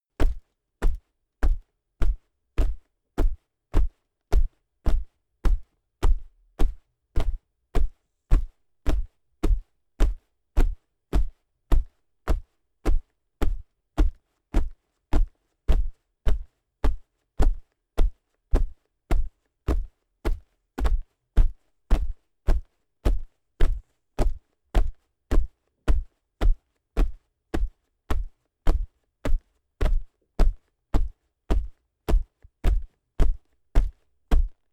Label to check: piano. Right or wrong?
Wrong